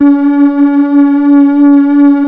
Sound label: 3100 TRI C#5, Korg PS3100; Back In Time Records; Korg Universe VOL-1